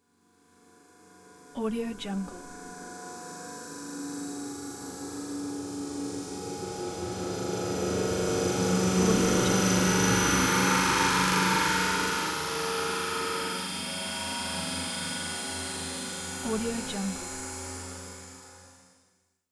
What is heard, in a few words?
scifi cybernetic ambiance